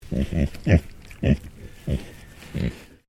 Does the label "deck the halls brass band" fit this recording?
No